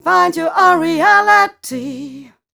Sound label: f mel d flat, fem Vocals; Mutekki Media; Diamond Vocals 2